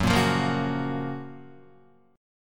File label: Gb Major 7th Gb down Guitar; GbM7 strummed; JGuitar